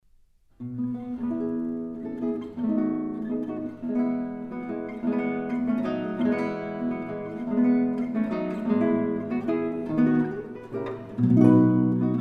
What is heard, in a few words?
Waltzes for piano, 4 hands, transcribed for guitar; Op. 39- No 8